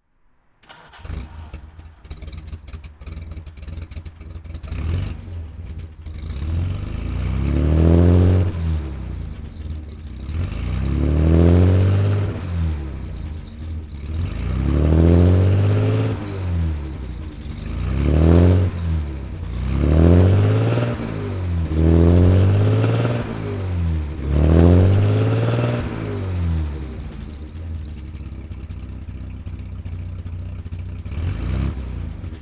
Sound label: alvis speed25 throttle